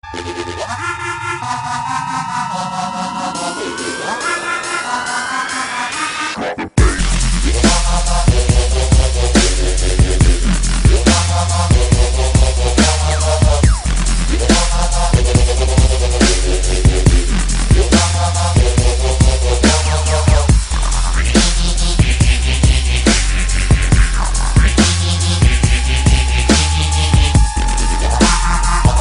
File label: Fine Dubstep